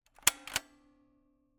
Camera, Kodak EK2 Instant, polaroid, large, plastic, springs, snapshot; Eiravaein Works; Vaeyan IV